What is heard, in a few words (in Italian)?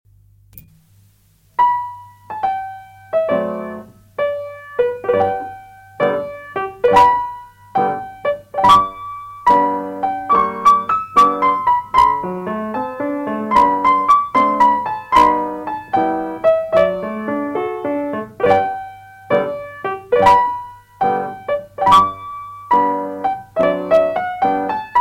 danza classica stacchi; musica per danza classica e ginnastica artistica